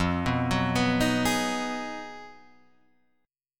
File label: B 6th F, guitar chord; B6/F Chord {1 2 4 4 4 4} arpeggiated